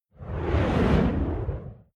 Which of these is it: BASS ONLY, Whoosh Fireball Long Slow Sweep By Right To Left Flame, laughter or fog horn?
Whoosh Fireball Long Slow Sweep By Right To Left Flame